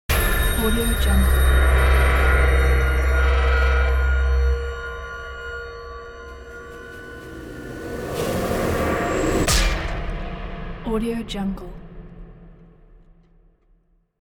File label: dark haunting logo